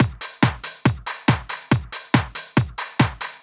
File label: Addon Loop, Drum Loops 140bpm, Perc Loops; Zero-G Trance Inducer; 90 s Sample CDs